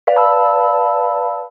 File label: alerttone echo; The Light Phone II